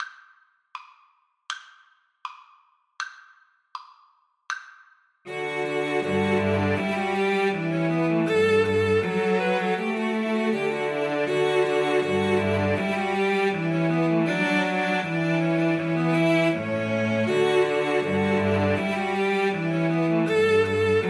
Bach Gavotte Cello Suite 6

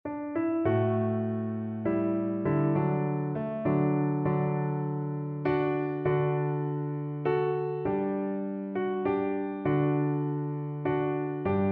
Shenandoah, Double Bass

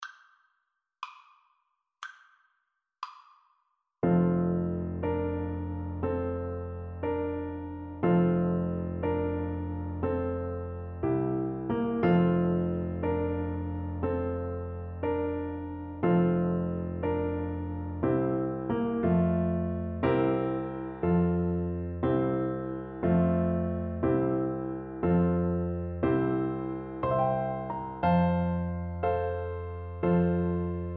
slumber song, Trumpet; dorn

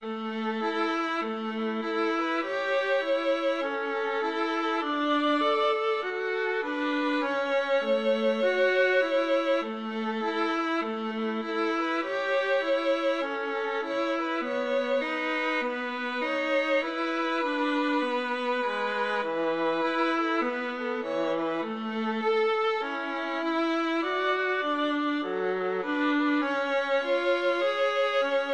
railroad, violin viola duet

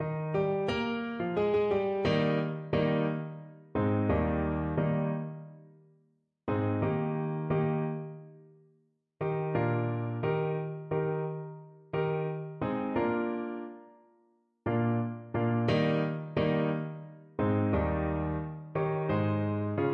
Baritone Saxophone

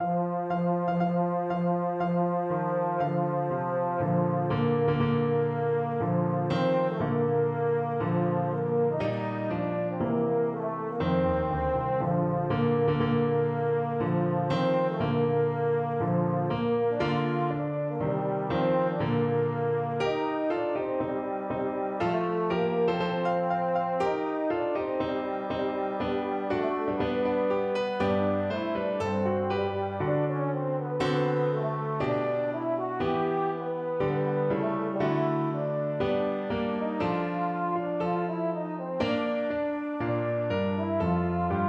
baritone, baritone horn

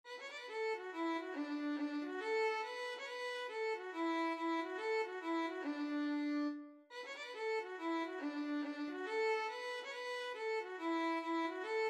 violin

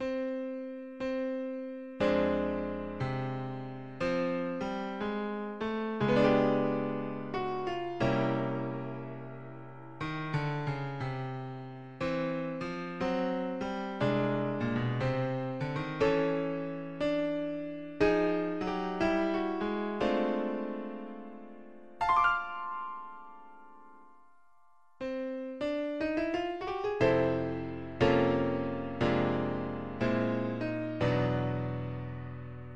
happy jazzy birthday, piano

